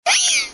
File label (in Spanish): Grito de Meowstic; Gritos de Pokémon de la sexta generación